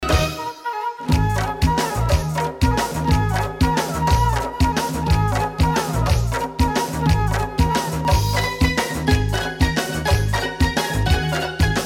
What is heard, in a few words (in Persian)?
music sedaye zan